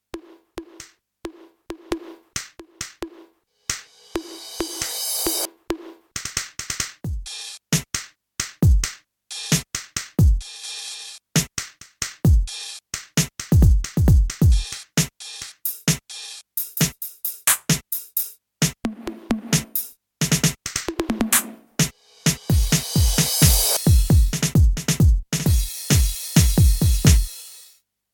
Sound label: KORG MPS10 Eclectric Soul Kit